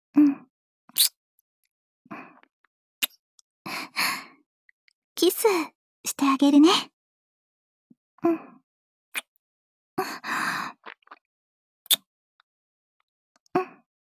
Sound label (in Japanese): まずは優しいキスから……(ボイスのみ); 貴方との新婚初夜